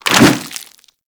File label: Infested Swing; Puss Ancient